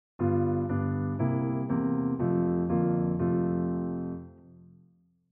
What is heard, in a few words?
Happy Hibiki Piano B